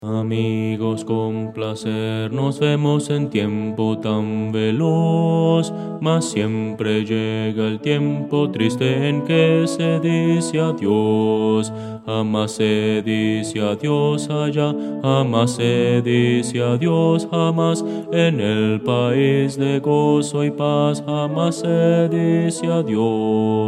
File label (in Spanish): bajo